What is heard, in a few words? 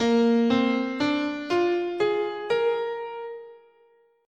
Bb Dominant Pentatonic, scale